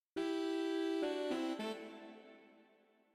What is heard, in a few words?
Sax riff